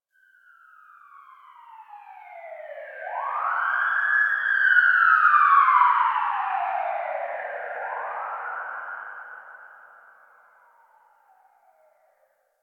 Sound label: Exterior Distant Ambient Siren G; Warframe; Ambience; NineteenNinetyNine